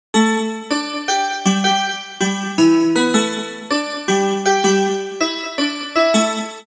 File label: riff; micro prism